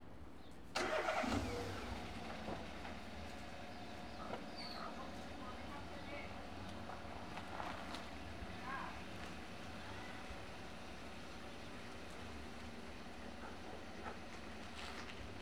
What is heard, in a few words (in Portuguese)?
Carro dando partida em rua de pedra centro historico goias velho passaros; maquinas; canais-stereo; pessoas; colecao sonora do cerrado